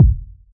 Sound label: kick drum sample a key; kick drum samples